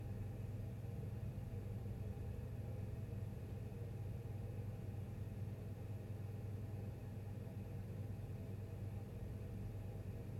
Roomtone Silent

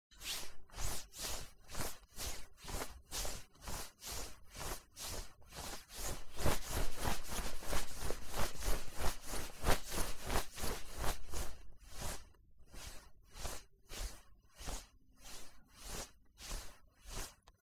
Clothes Shuffling

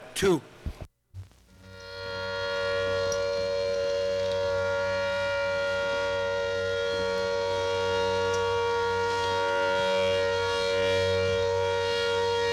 Air Raid Siren

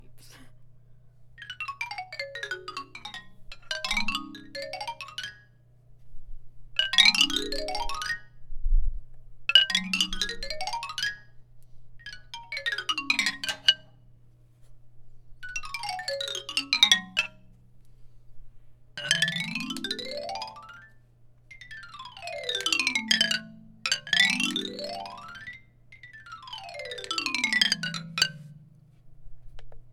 Marimba Sweeps

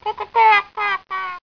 Cacaw

